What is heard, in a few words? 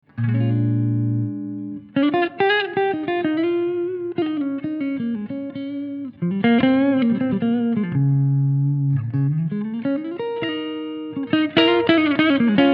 Double Clean Solo